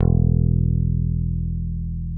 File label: P-B PICK E2, BASS; Greytsounds; JAZZ+P BASS